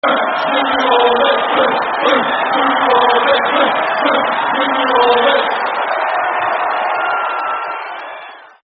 Blues Blues Zulu Army No Cheer, terracechants, Birmingham City